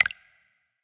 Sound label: UI Bleep Check Confirm High; blue archive